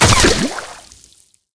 bullet hit water

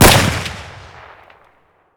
toz fire close; Escape from Tarkov

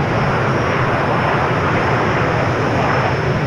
rocket fly loop

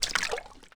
grenade water

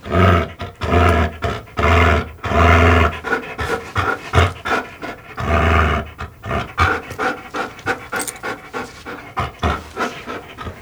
breathe growl; Rust

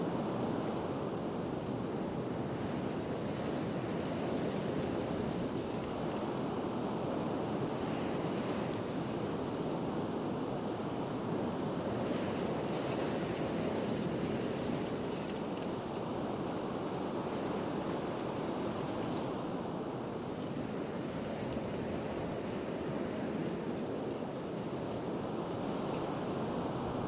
Ambient Wind; DCS

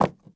horse footstep wood hard; Rust

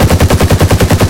aa12mm fire loop, guns

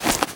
chemlight; Call of Duty Modern Warfare

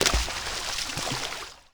water land medium shallow

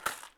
pack generic pickup; Escape from Tarkov

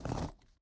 ui pickup stone; Rust

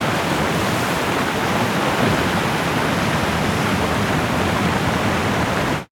damage hits explosions, Warships

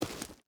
footstep rubber boots gravel walk; Rust

